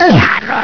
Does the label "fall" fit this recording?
Yes